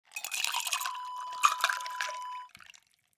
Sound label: ice water pour